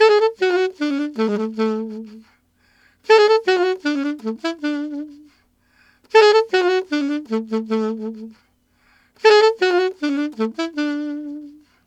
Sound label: Alto Polka in D maj, Sax; Sonic Foundry (Sony Creative Software)